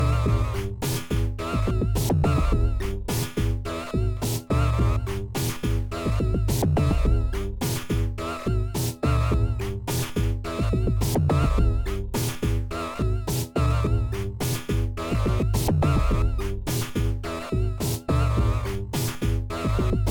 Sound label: SpazeDrum Analog